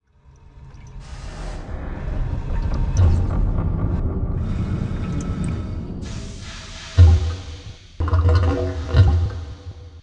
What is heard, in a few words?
Mountain B Ambience